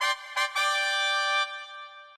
quests success

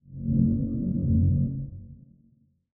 portal ambient; nether

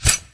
heavy leggings

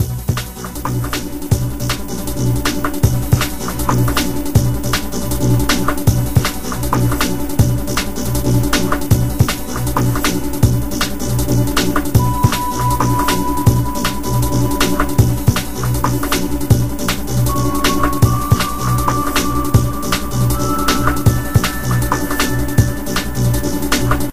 jukebox; server-nalc

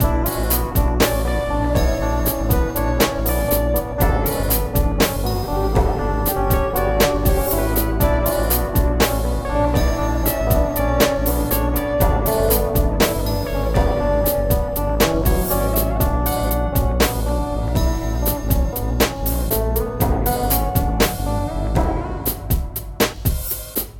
jukebox song; server-nalc

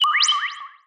capture rod open egg; maidroid; maidroid tool

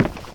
wood footstep; minetestforfun game; nalc server

